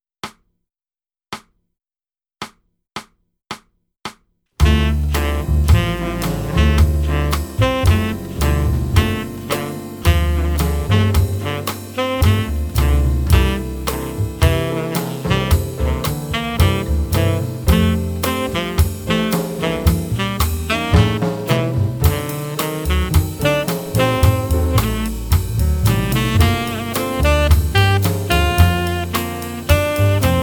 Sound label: Demo with click, Concert Backing Tracks